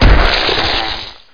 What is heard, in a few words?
die big, imp; Hexen II